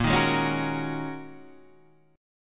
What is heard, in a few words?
G sharp 7th Suspended 2nd Suspended 4th